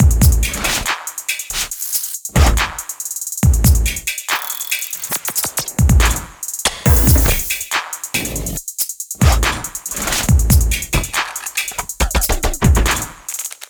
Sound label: Snow Drums; Trap (Трэп)